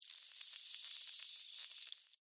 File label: Acid Burn Sizzle, Scifi, Acid, Burn, Sizzle, Fizz, Small, Science, Fiction